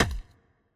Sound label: Boat Man Wood